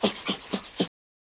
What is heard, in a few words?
Chimp pos laughter